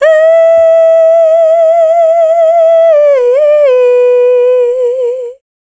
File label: Dance Vocals, FEMALE